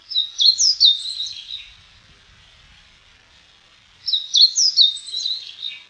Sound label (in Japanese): hoojiro; zukan